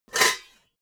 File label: pots clank hard